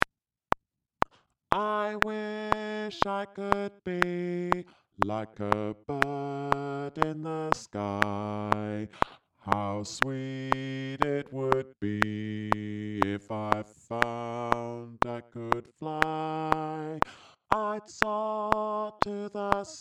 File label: Bass, gospel choir